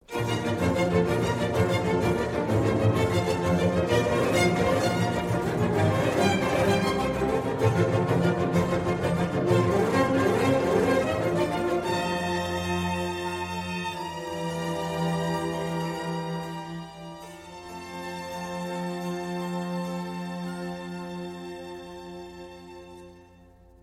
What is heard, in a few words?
Bassoon Concerto in B-Flat Major, Presto - Adagio; Vivaldi; RV 501; La notte